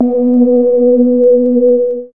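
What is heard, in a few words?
ambient synth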